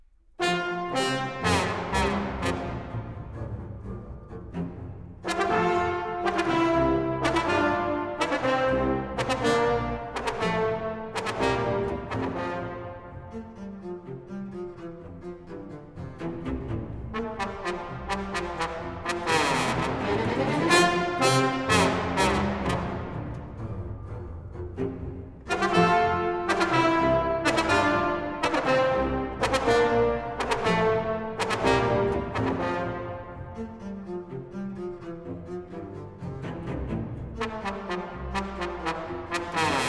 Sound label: Pulcinella, ballet with song, orchestra, Vivo